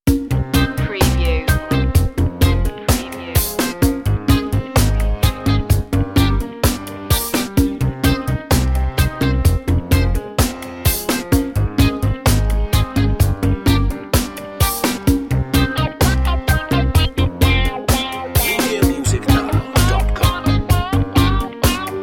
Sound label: reggae thing